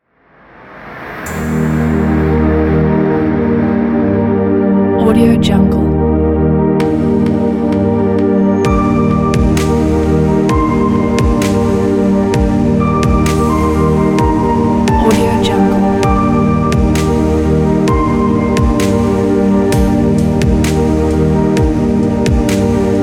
cinematic chill

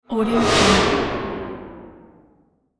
spaceship swoosh

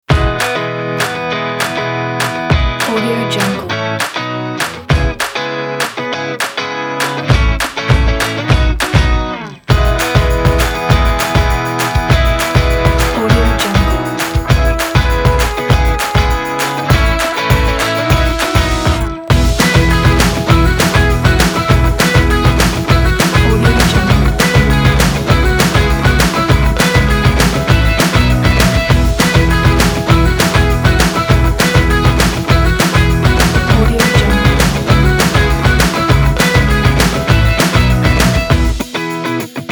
summer happy

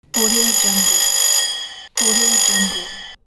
call notification

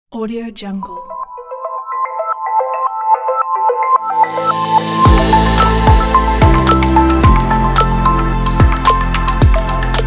space tech logo

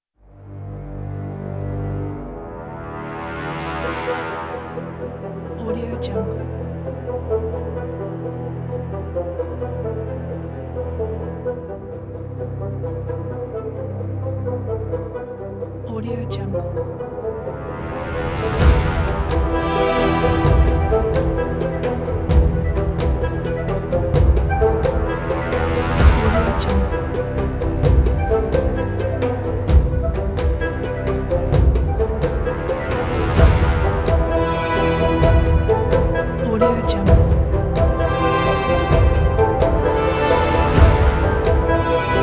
enchanting ascent

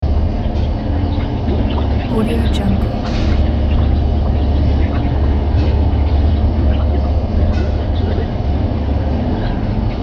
machine room loop

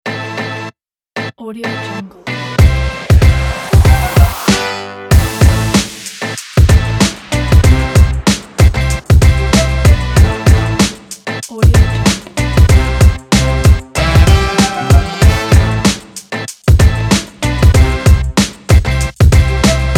energetic hip hop beat

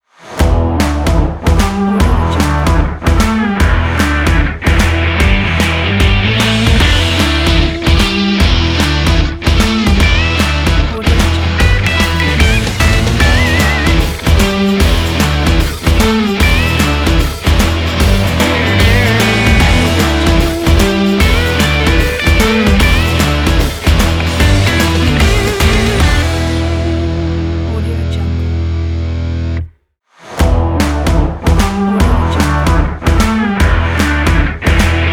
action powerful indie rock logo

